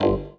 error; NVDA; Waves 2

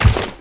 obj get; Original TK Sounds

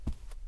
carpet step; Golden Crayon Sounds

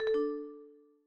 screen Curtain Off; NVDA; Cubic

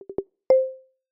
Arrow Build, iOS Sounds

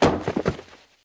metal fall; Golden Crayon Sounds

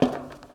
bleacher step; Golden Crayon Sounds

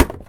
wall furniture; Golden Crayon Sounds

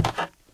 bridge step; Golden Crayon Sounds

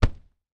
wall sofa; Golden Crayon Sounds

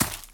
rocks step; Original TK Sounds

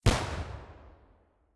grenade explode dist; Original TK Sounds

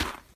gravel step; Golden Crayon Sounds

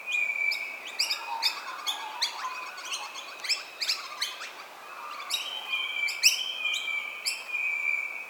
birds; Golden Crayon Sounds

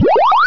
Web Page Loaded, 8 Bit; ESAN VO Sound Schemes; 8 Bit Sound Pack

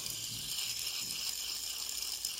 fidget spinner loop; Golden Crayon Sounds

